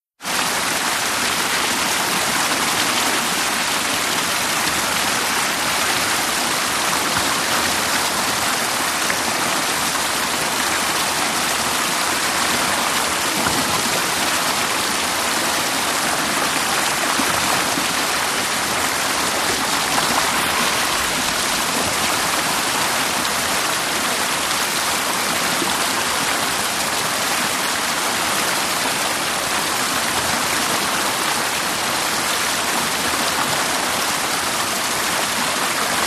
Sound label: Waterfall Sml CU Inte